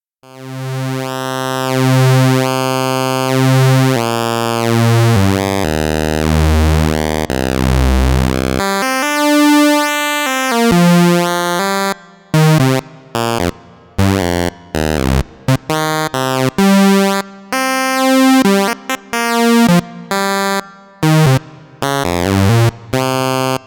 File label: single vco pwm; synth; jurgen Haible; living vcos